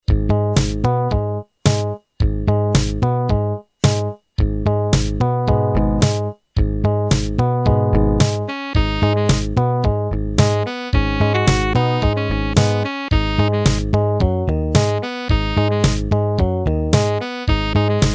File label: driving